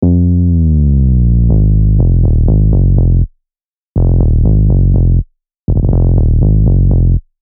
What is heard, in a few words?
Bass; Letter; Unison Mainstage Collection for Serum